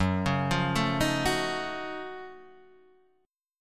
F sharp Minor Major 13th

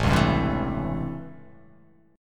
A 7th Sharp 9th; A7#9; strummed; JGuitar

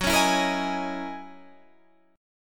F sharp 13th F sharp Guitar Standard; strummed; JGuitar

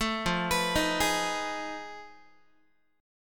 F 7th Sharp 9th Flat 5th F down Guitar; F7#9b5 arpeggiated; 12,13,14,13,12,x

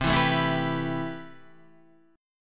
C Major 7th Add 6th C

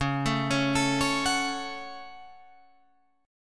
Db Suspended 4th Sharp 5th Guitar Open F; arpeggiated; 13,16,13,16,16,13

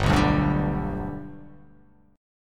G Major G down, chord; G strummed; JGuitar

